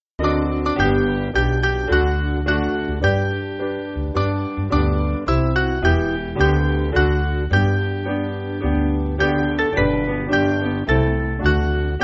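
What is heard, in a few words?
Piano, Music Backing; Mainly Piano (CM)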